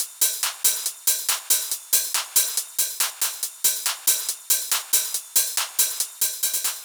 Loop 140BPM, Drums & Perc; Vengeance Samples; D minor

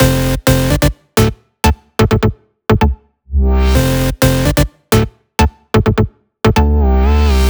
128BPM Simple Melody; VFH1 128BPM The Simple Kit

